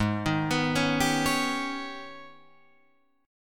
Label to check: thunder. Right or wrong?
Wrong